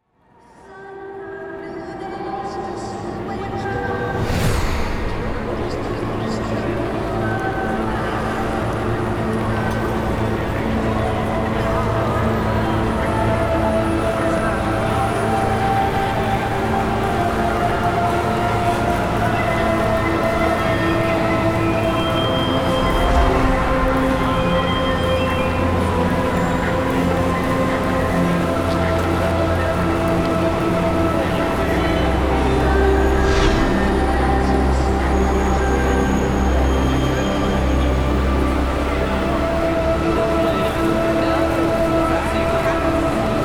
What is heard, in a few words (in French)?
Musique